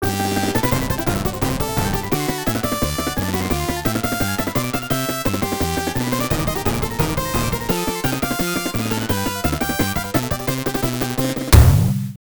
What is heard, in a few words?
Victory; tiny toon adventures acme all stars; genesis